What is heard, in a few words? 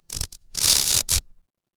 cartoon stretch